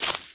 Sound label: Sheep run